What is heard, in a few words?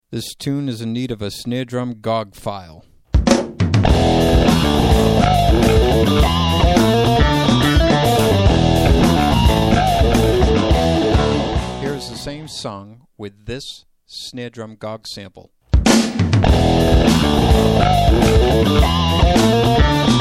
Custom Snare